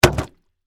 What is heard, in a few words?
Jug Set Down Full, Water; Foley; Set Downs (Sound Effects:Objects); BlastWave